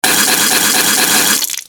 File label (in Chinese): 打斗 连续吐血